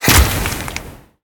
large impact; smite; thanatos

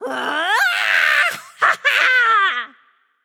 battle cry; smite; babayaga

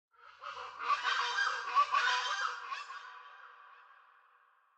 ambience good bird; smite; conquest

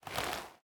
armor; smite; chaac

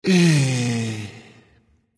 sigh; Smite; cabrakan

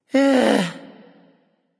ugh; smite; mercury

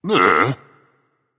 ugh berserk; cuchulainn

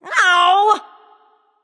ouch; smite; pele

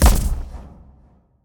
footstep; smite; npc; grkconqs8 titan chaos; s8chaostitan

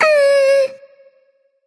tongue; smite; jingwei

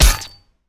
basic hit; smite; osiris

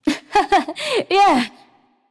"yay"; smite; voicepack; nuwa

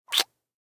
kiss; smite; chiron

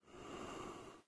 idle breath in; smite; god odin

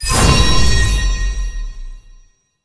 activate; smite; hera

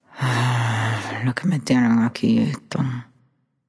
nv grumble; smite; awilix

